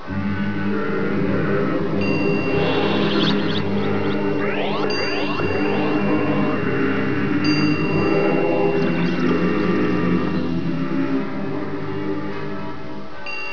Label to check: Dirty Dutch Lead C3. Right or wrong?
Wrong